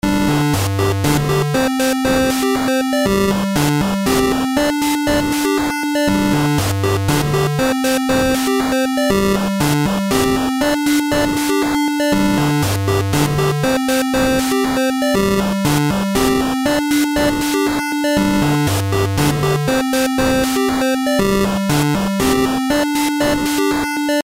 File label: nautical loop